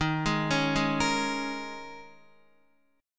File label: Eb 7th Suspended 4th, Guitar; Eb7sus4; arpeggiated; JGuitar